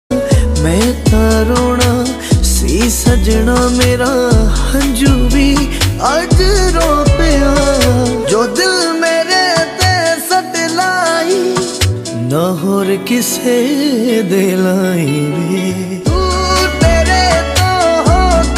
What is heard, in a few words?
punjabi song ringtone